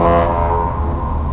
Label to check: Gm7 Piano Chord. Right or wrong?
Wrong